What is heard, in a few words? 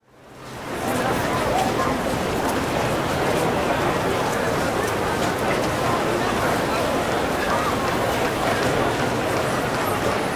Crowd Outdoor Pedestrian Walkway; crowd walla; Sound Ideas; Walla Series